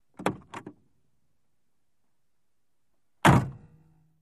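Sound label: Buick, Exterior Pov, Door Open and Close, close up; 1938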